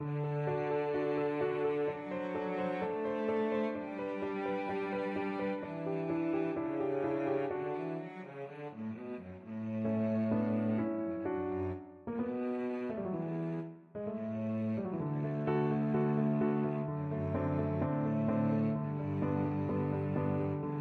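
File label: violoncello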